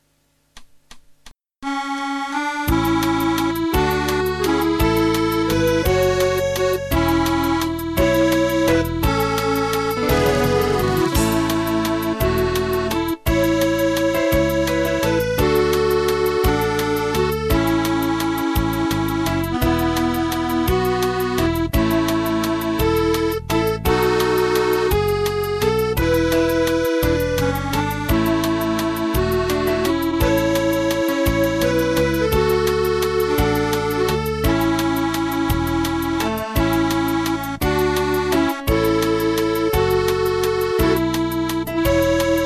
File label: Accordion Fast Waltz; Rose of Tralee